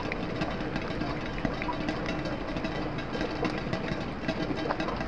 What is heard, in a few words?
rain tin; Kingpin